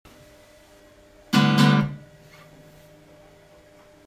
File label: Strum